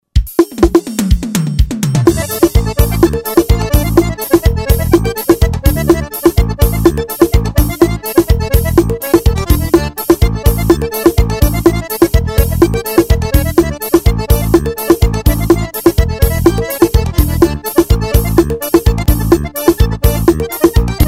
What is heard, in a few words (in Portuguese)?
vaneirao